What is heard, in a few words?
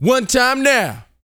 VOCAL F; ILIO